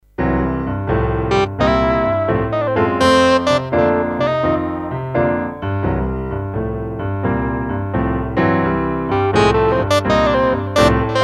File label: Blues Mood